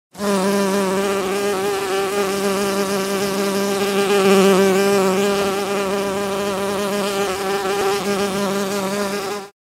zvuk jujaniya pchely

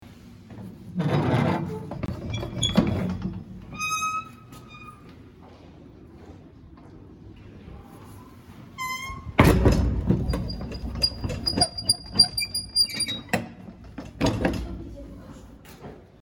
zasov dveri; Эти звуки были записаны в замке, который использовался как тюрьма или темница

